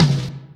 tom, drums; virtual drum sounds; custom drums

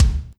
kick; virtual drum machine

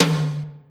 tom; drums; virtual drum sounds

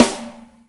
snare, drums; virtual drum sounds; custom drums

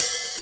open, virtual drum sounds

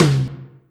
tom, drums; virtual drum sounds

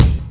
kik, drums; virtual drum sounds; custom drums

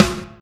snare, drums; virtual drum sounds; custom drums

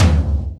tom; drums; virtual drum sounds

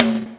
tom, drums; virtual drum sounds; custom drums

